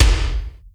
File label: REVERB K; Crypton - DrumBase5000; R70 KIT #2